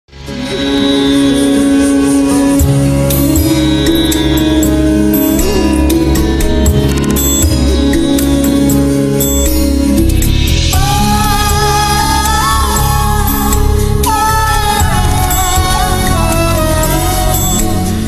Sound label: Background Music; Kunti Maa; Karn Sangini; STAR PLUS